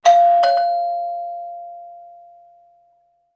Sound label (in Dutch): deurbel